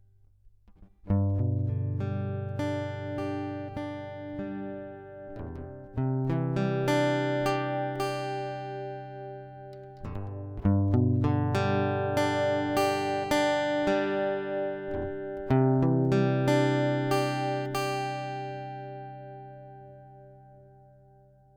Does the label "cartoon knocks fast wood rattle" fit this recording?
No